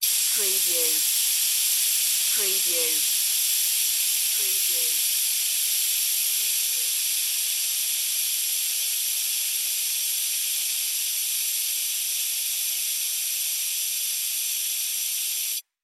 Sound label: Air Leak Gas Long; sound effects bible